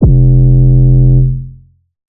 808 one shot F; Slate Digital BASSHOLE Sample Pack

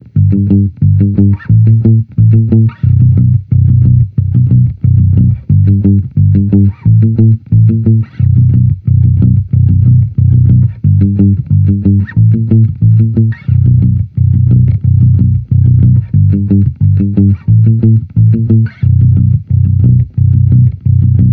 Amped loop 90 Bm, Electric Bass Loops; Slate Digital BASSHOLE Sample Pack

